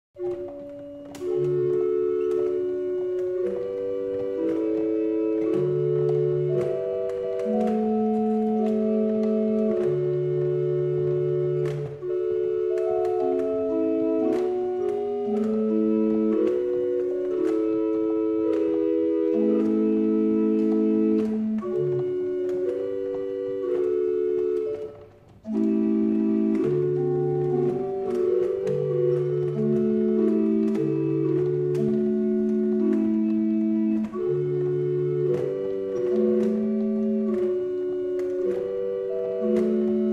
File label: barrel organ; Liddington